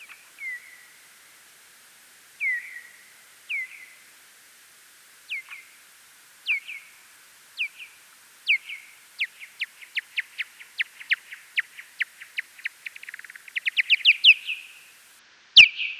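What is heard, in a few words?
Nyctalus noctula, Fledermaus; Boehm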